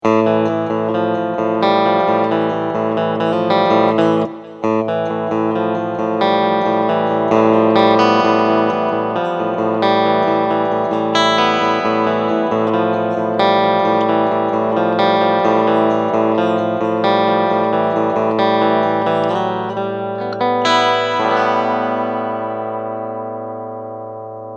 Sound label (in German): Clean Picking Steg Humbucke